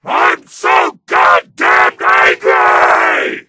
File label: stabbed; tf2; freak fortress 2